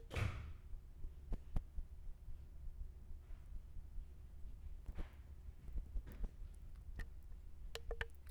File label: EYE BLINKING